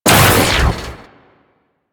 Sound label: Metronome; Warframe; Amalgam Moa; Abilities